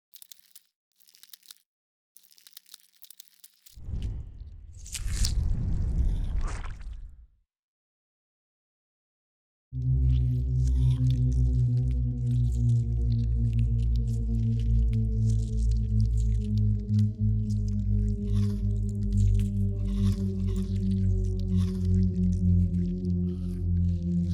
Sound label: ship colossus idle closing; biogenesis